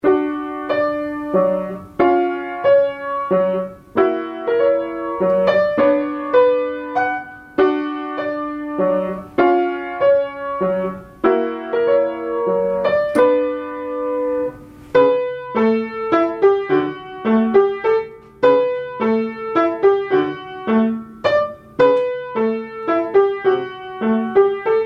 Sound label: Mazurka